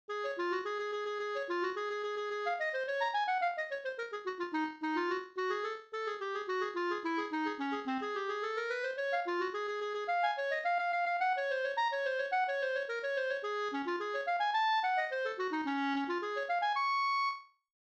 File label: Clarinet